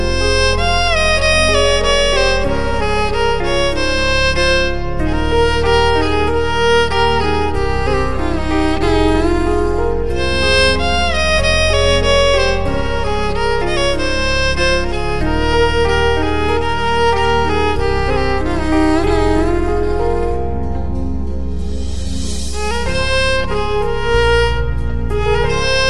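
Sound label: violin instrumental; aashiqui 2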